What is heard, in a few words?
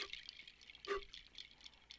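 Music Village Calm Near; From Dust; ps3; xbox 360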